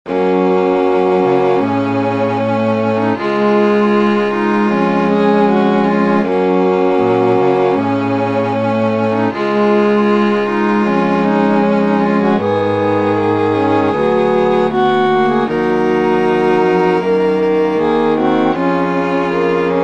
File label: Nocturne